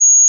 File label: A flat 2, pure tones